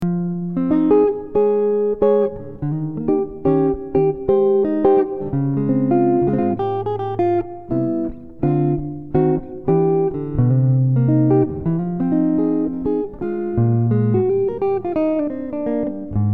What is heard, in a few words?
jazz flatpick on the electroCoustic